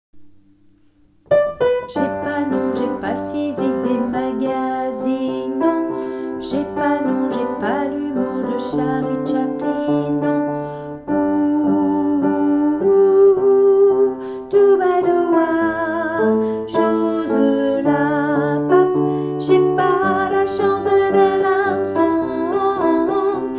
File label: alto